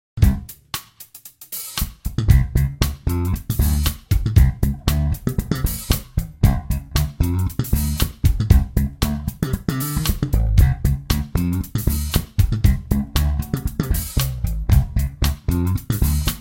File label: Slap bass in D7